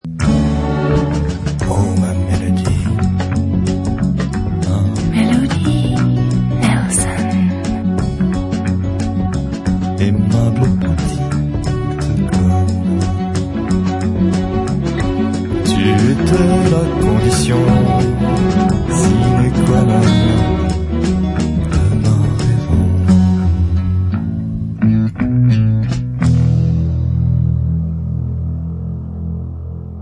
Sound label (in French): Prise Voix Alternative